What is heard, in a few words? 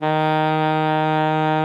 D#3, alto sax; Xsample VOL-2